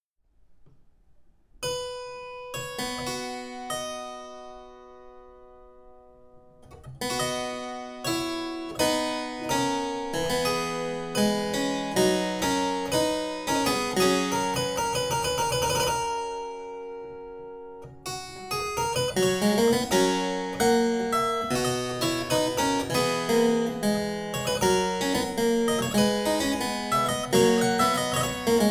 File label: sweelinck toccata